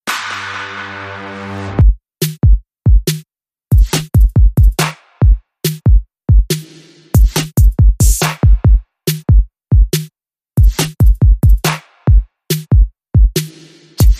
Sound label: Beat